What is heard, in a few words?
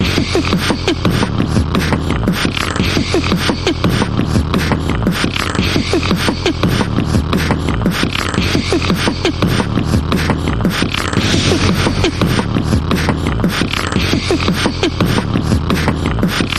Comic Mouth Percussion Variation